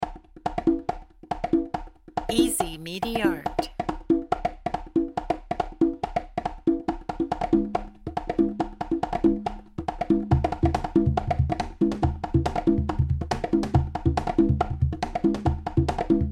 tribal advertising

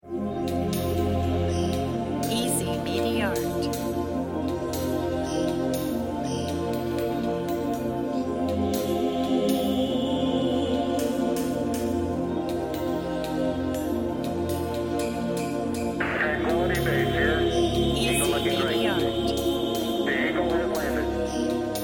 travel space; metalicous moon saturn space

